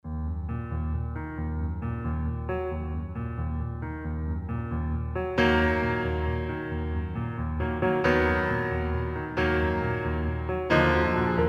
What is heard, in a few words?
Piano; Luigi's Mansion 3; The Great Stage (4) - Amadeus Wolfgeist Piano 1